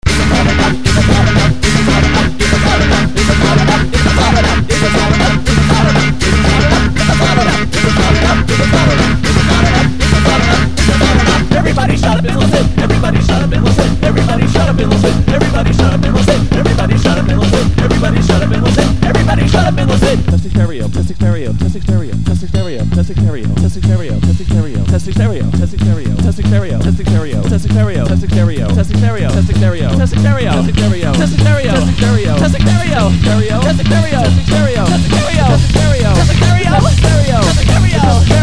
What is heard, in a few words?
test tones